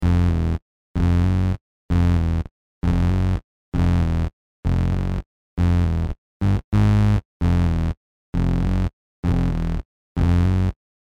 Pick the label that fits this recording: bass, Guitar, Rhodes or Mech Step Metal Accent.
bass